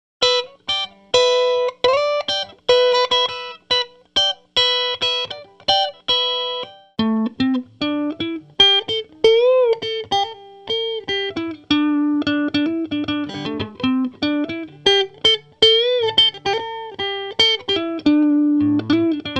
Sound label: amp head jazzy move clean; dr zee se cdc amp head